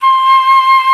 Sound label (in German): FLOTE, Alpine Volksmusik; Best Service